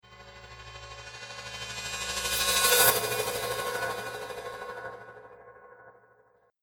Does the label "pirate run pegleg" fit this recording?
No